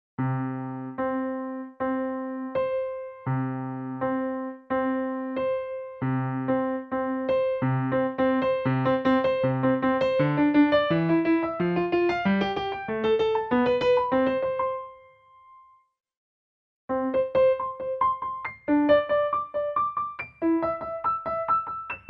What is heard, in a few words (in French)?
Octaves et Gammes jonglees, piano; Exercices de mécanismes musicaux; Ashley Wheeler